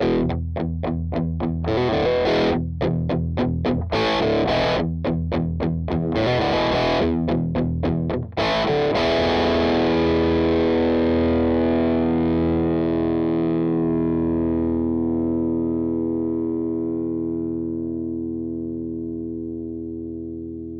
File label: Distors G50 33m, Distors G50 Cable Tone 33m; Line 6 Relay; wireless pentru chitara de la Line 6